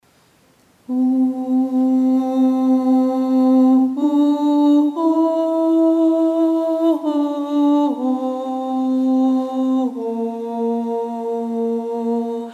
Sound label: Alto; Wexford Carol